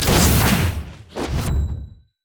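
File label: enchanted crystal arrow; LoL; ashe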